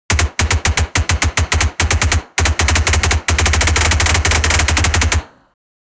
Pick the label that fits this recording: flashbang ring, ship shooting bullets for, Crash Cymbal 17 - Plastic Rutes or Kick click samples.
ship shooting bullets for